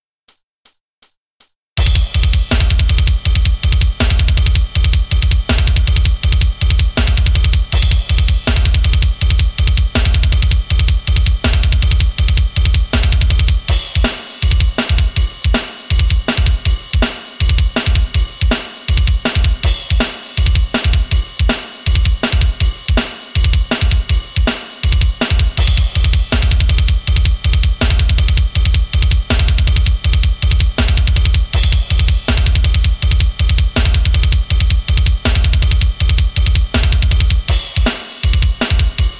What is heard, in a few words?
metal drum loop 160